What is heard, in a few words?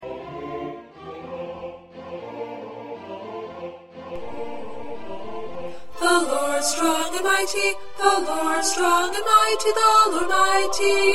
Alto, choir